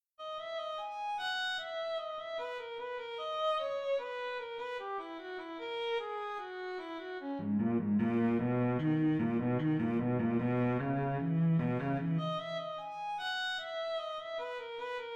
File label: Conversation for String Quartet